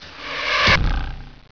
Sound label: BOW HIT; Caesar 2; DOS